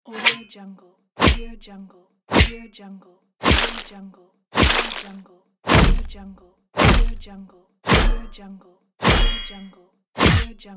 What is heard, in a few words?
spear weapon pack